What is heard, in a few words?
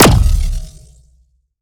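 Sci weapon Shot Medium Blaster Pulse Zapper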